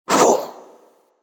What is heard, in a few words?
Damage (Voice); children of morta; Scorpion King